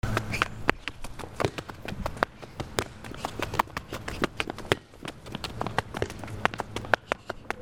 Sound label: FOOTFALL